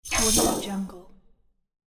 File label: future transition